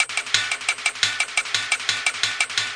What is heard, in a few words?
174 thick hat clanger, drum loops and breaks; 161 to 180 bpm